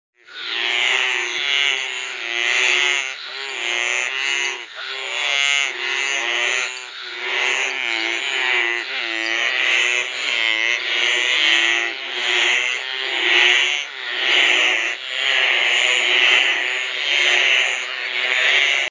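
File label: Trachycephalus typhonius, frog; guyane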